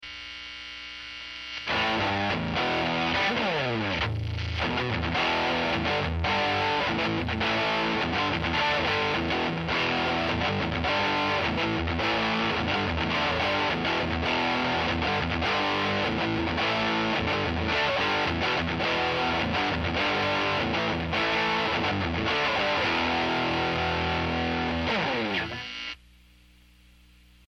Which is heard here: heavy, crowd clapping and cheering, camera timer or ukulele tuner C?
heavy